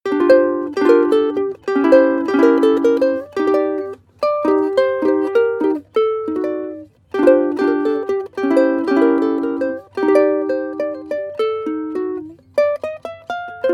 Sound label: ukulele jazz sequence; loops